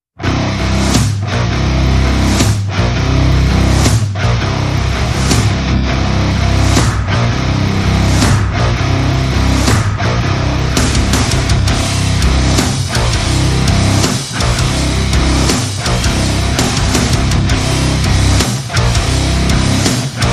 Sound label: No Guitar Solo